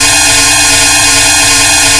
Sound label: fm voice single2; FFTimbre